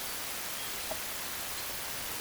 pond

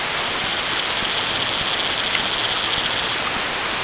pond

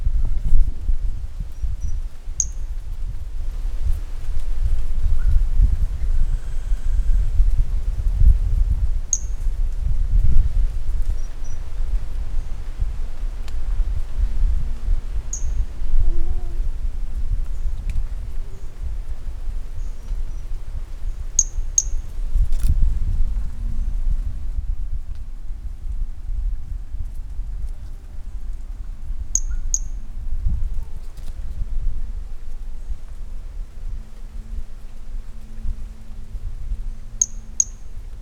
Brachycephalus mirissimus; M R Bornschein

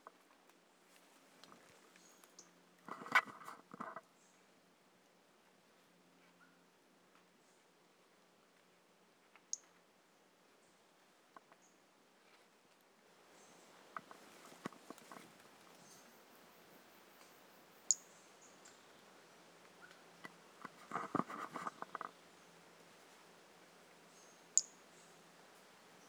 Brachycephalus mirissimus; A E Confetti

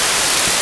pond

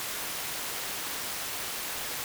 noise pond

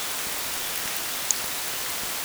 pond

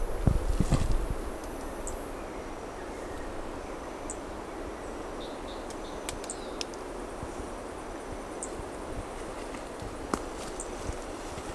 Brachycephalus sp two starting notes lost; Corcovado

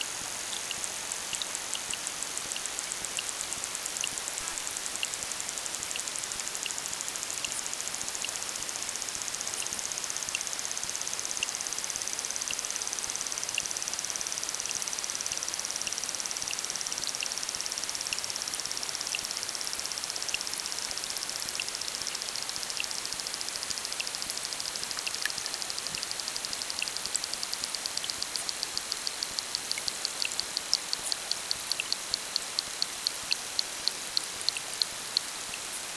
pond

